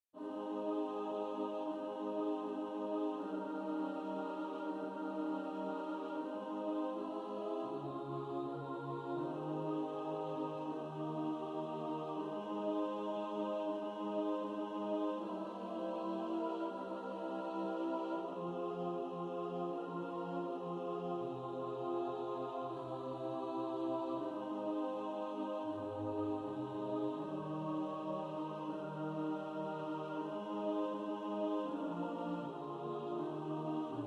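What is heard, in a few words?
alto, practice music; Praise ye the triune God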